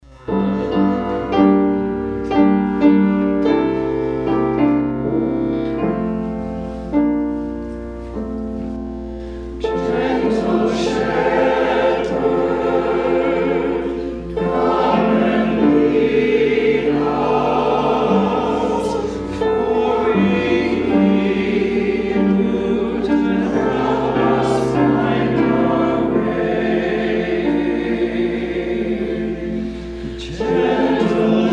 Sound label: Hymn; Hymns